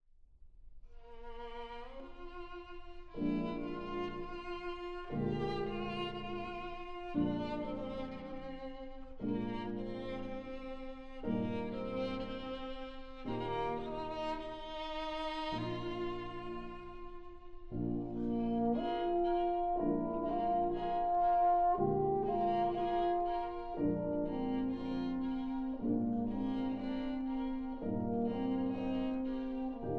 Trio for Piano, Violin and Horn in E flat major - Andante; Brahms Trio & Quintet